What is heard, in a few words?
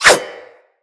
Dagger Whoosh; Weapons; Dual Daggers